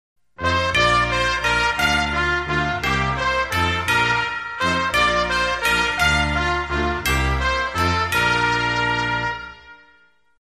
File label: We Wish You A Merry Christmas, Brass Band and Small Group